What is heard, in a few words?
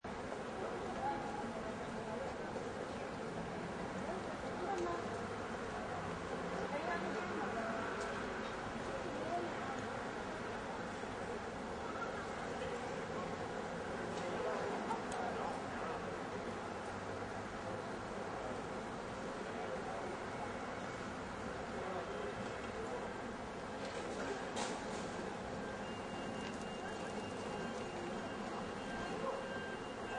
Small Town Open Plaza, Small town ambience. Open city plaza; Open city plaza, very light pedestrian crowd. Occasional traffic signals in background.; Lynne Music